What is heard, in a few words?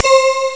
chorale; Amiga Soundtracker sample